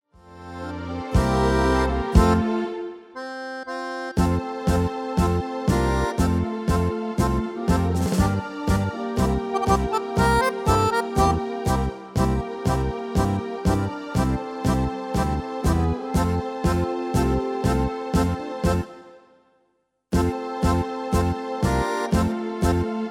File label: MIDI